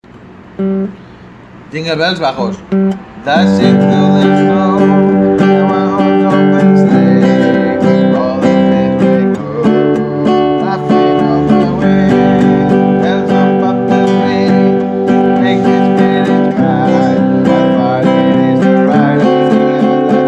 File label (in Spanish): voz baja